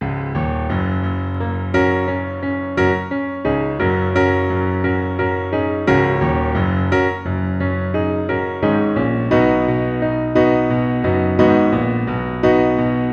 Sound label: Piano Mood